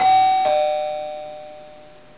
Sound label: DOORBELL